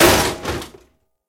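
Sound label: loud rumbling metallic sound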